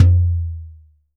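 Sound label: earth tone, African set percussion; Voice Crystal - Steve Reid Definitive Percussion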